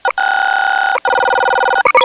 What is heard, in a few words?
panel romulan; Alachua County EMWIN System